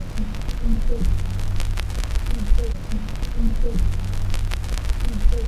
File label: Crackle Loop, Vinyl Noise